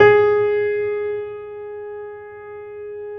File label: PED MF, GRAND PED